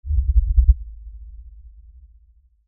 LFE Reverse, Lfe Reverse Element Swell Low Bass; Blastwave

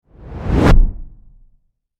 Horror Magic Spell Whoosh Deep Impact

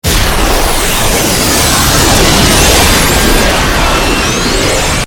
Ray Beam Burst, Science Fiction; Flange; Warble; 5.1

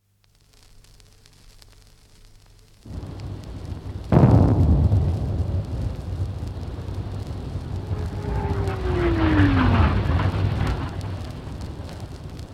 Sounds, Sounds of two guns firing; from 78 disc; Battlefield; BBC

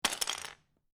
Lego, Single Lego Piece Drop Onto Pile Of Pieces; Miscellaneous Toy

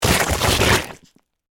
Impact Suction Mud, Texture, Large; Objects; BlastWave

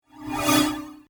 Scanner Beam Short, Scifi; Science, Fiction; Tech

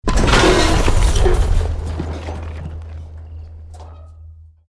BUILDING COLLAPSE SCAFFOLDING SMALL

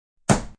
Table Hit, Open hand smack down on wood table.; Hitting Sound Effects; Human

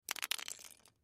Lighter Toss Slide; Lighters; Foley

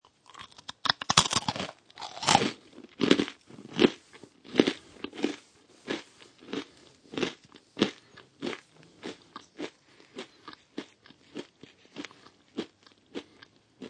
Person Eating Dry Crisproll Crunch Chew Munch; Eating Sound Effects

